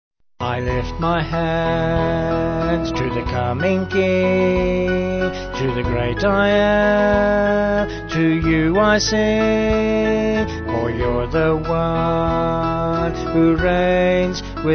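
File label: Vocal Band, Music Backing Files for Churches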